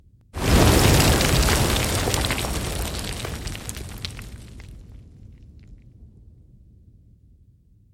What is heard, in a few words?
explosion debris